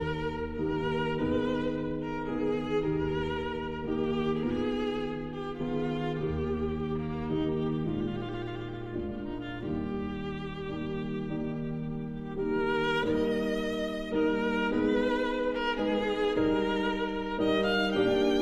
Sicilienne for violin and piano

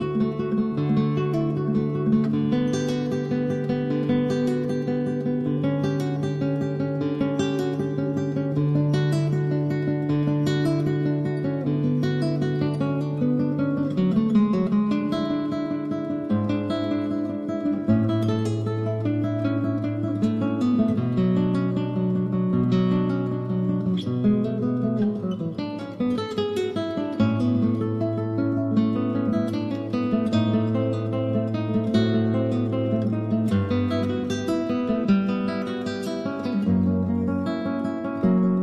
Cello Suite No. 1- Prelude

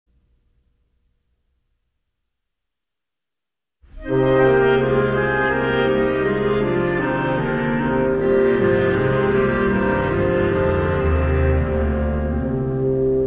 Suite of Carols for Woodwinds; BBC Concert Orchestra (Winds); O Come O Come Eman

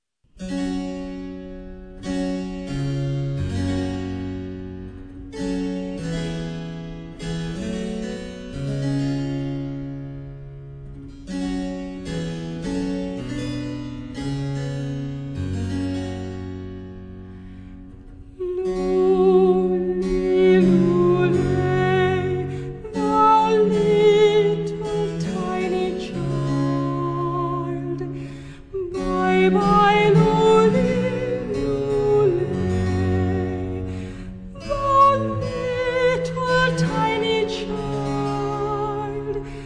harpsichord, Coventry Carol; Arthur Haas

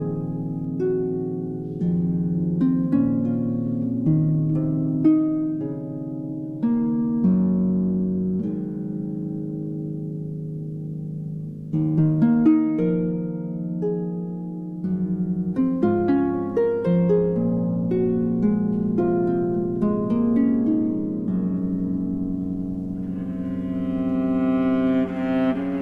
Harp Concerto Lullaby

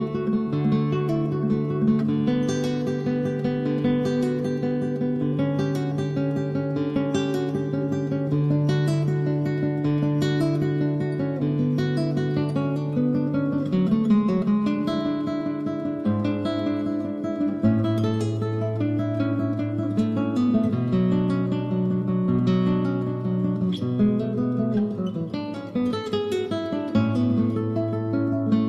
Cello Suite No. 1- Prelude

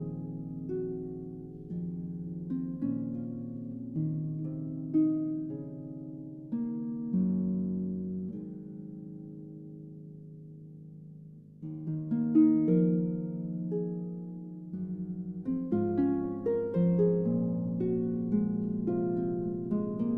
Harp Concerto Lullaby

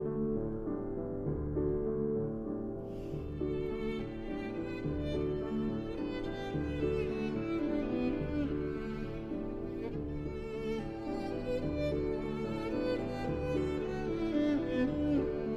Violin and Piano in D

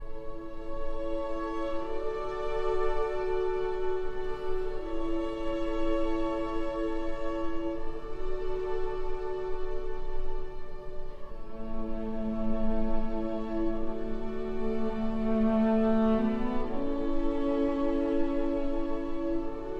for cello and strings

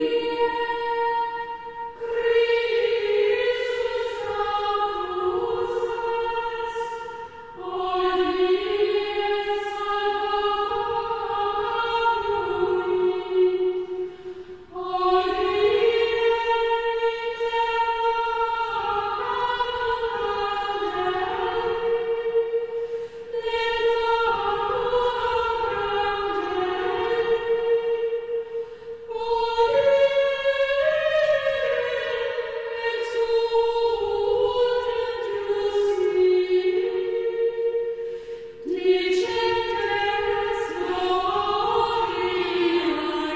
Chant Antiphon; Traditional; Chester Processional

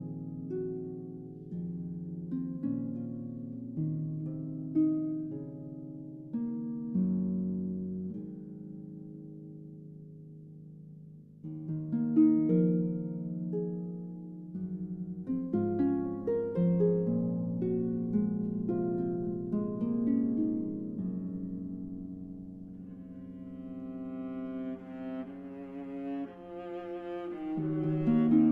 Harp Concerto Lullaby

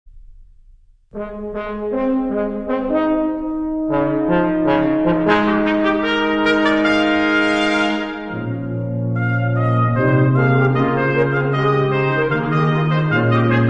Brass Carols from the British Isles Medley

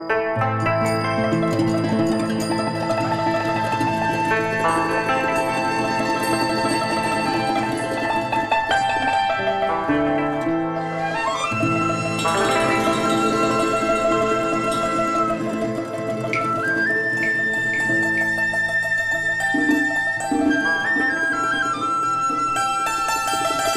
TRADITIONAL Chinese, Picking Flowers

